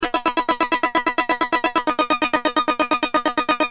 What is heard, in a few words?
Keys, Industrial